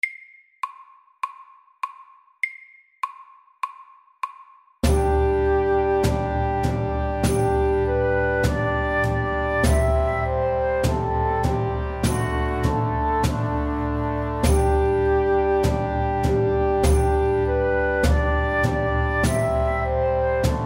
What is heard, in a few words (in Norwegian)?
Engelsk melodi MIDDELS